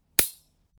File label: Nail clipper